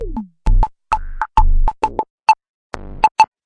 deep plingy thing, 132 bpm, DRUM LOOPS and BREAKS

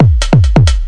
135 bpm kinda huge dance, drum loops and breaks

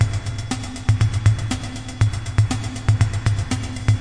120 bpm, hihats and faraway electronic drums

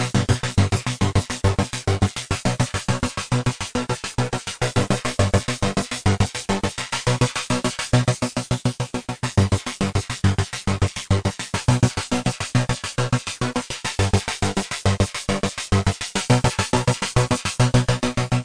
104 synth filter sparkles; melodic loops; synth and electronic loops; superheart

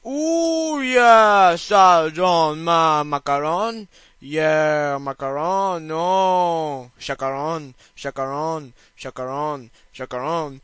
ooooh yeah, male singing; VOCALS and SPOKEN WORD

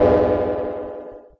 clang; pulsar

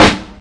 SN, Dirty Hip Hop Kit; drums full kits; style kits

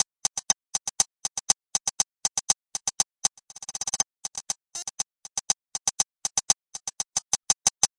120 bpm rollin triplets end hats

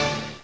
HIT GATE, Kawai R50 drum machine; drum machines

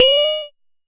Jomox MBrane 11, drum machine; drum machines

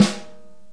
DW Map Sn, Real Kit; REAL LIVE KITS

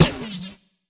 fx drum, Electro FX

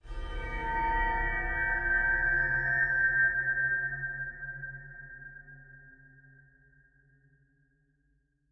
dark chime pad; Atmospherics

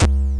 c64 sid kick, Commodore 64 8bit SID Drums; LO FI

